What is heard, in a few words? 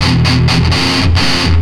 Riff, Industrial; Nasty